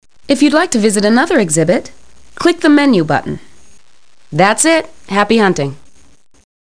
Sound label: pop out